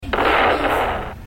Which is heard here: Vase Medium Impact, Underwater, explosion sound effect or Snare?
explosion sound effect